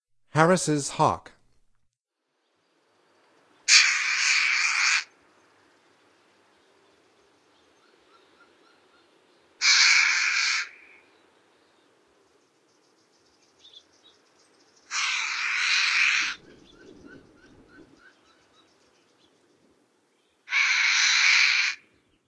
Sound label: Harriss Hawk; North America; Stokes